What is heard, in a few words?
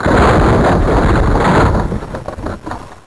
dump rocks; moria